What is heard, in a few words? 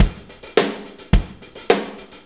4-4 Loop, Drum Loops, Full Kit Loops; Drum Tools